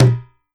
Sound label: MIXED DRUMS